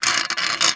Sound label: Metal Bend; Stair Horror